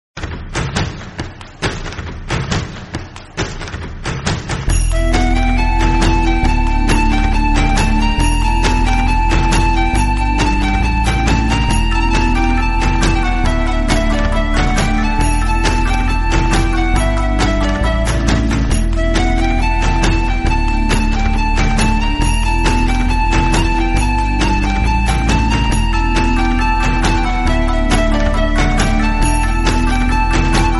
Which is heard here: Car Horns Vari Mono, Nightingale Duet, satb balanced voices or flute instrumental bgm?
flute instrumental bgm